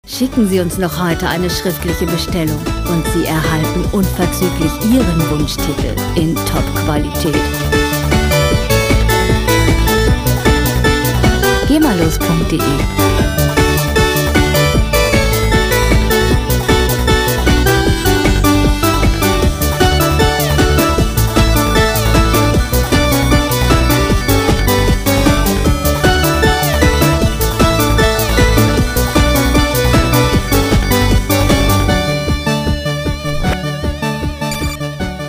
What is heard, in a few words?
chiptune